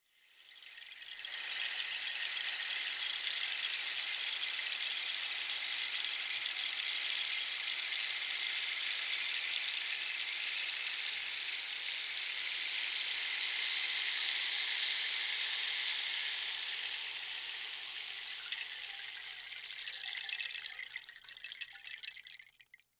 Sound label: crackles; Cartoon - film effects